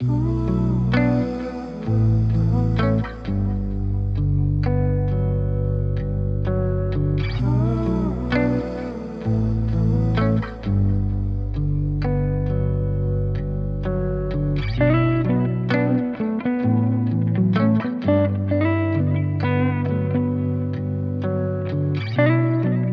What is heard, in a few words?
130 BPM B Min, Guitar Loops; Rose Colored Glasses; Cymatics; Orchid Sample Pack